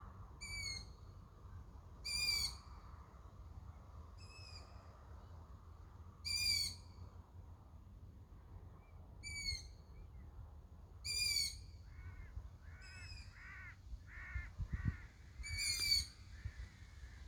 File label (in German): Ruf der Ästlinge